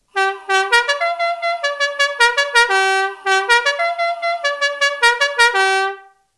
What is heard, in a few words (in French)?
son call to the post, klaxon